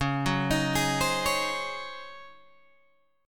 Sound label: C sharp Major 7th Suspended 2nd Suspended 4th Guitar Open G